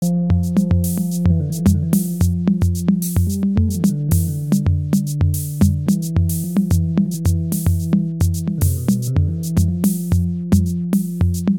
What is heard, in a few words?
drum machine